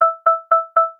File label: timer final second; Vivo X80-Pro